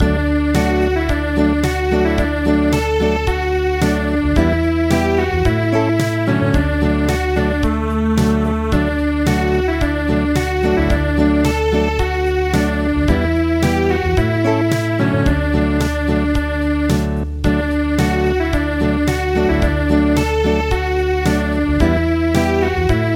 Village dance Acoustic; traditional; gangar

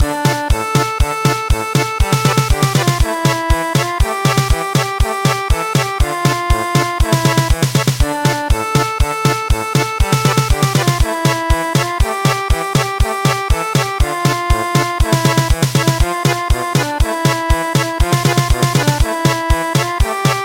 German Polka

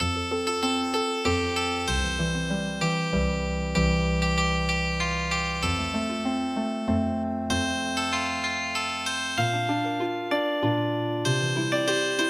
Healing Harp, midi traditional; Zephye Of nightfall

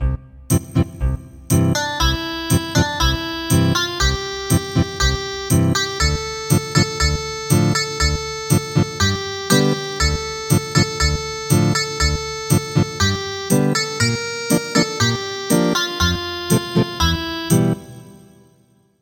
Ethnic Guitar

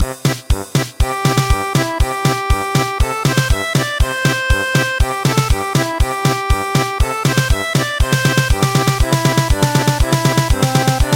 German Polka; Candle Dance

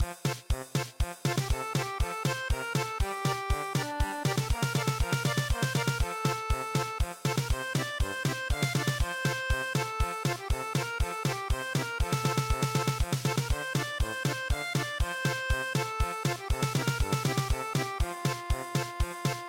German Polka

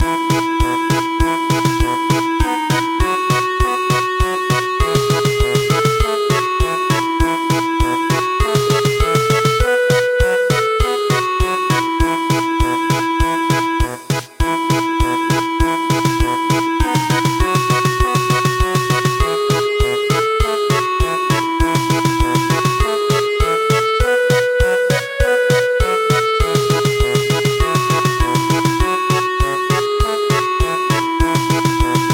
German Polka; Happy New Year Greece

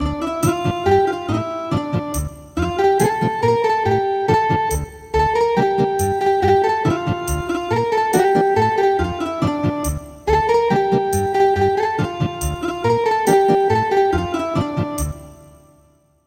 Ethnic Guitar; A new joy has been revealed

